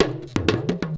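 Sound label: big tama groove; Best Service; World Colours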